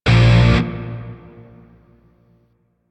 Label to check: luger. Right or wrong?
Wrong